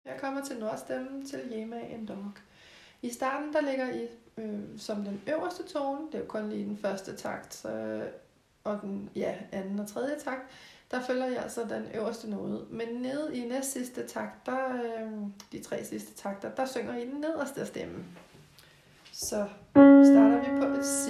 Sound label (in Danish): tenor